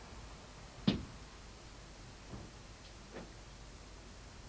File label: window close